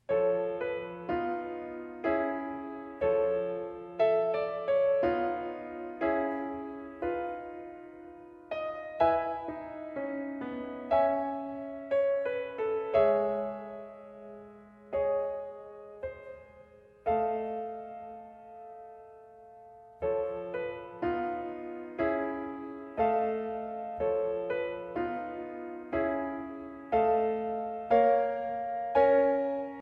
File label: piano; op.2 no.2